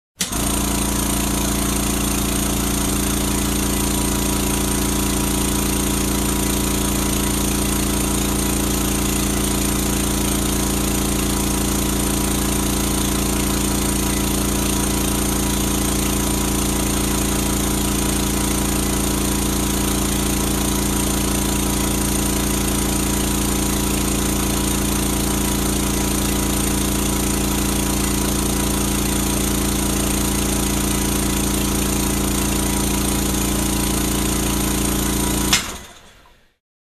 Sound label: Heavy Industrial Motor Machine Noise